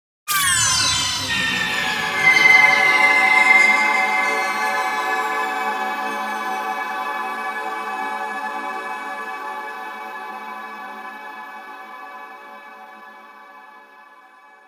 Bed Synth Vox Pad Shimmer Delay; 5.1 STEREO MIX; Blastwave

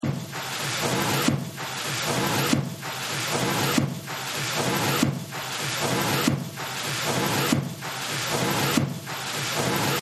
Factory; Factory 07

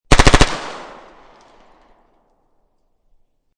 MP5 9mm 3 Burst, Machine Gun; Machine Guns; VRSonic

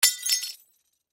Crash Glass Debris Impact; Crash Glass Debris Single Piece Drop; Debris (Sound Effects:Materials); Blastwave

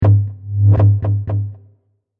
Drum Hit Heavy Mangled; Stutter; Impacts; CraftedSignals

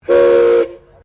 Train Steam Engine Whistle; Bellarine Tourist railway vehicles; Train Sound Effects

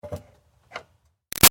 Car Highlander Gas Door Open; 2005 Toyota Highlander V6; Cars; Vehicles

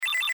Sci Fi Beep High Pitch Tone; Tech; Blastwave

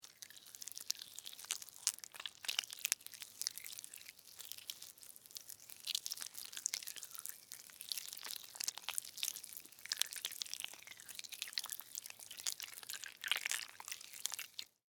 Slug Crawl Move, Slimy, Wet; Animal, Slug, Crawl, Movement, Slimy, Wet, Insect, Bug; Worms; BlastWave

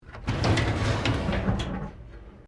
Drawer Metal Filing Cabinet Open; Various domestic drawers; Household

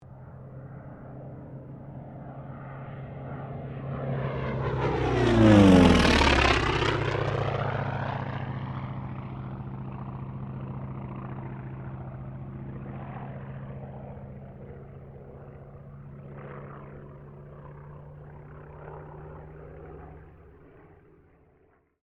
AIRCRAFT T-28D TROJAN PASS

